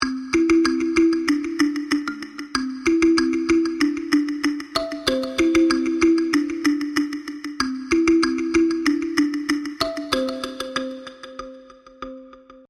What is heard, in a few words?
Kalimba Lullaby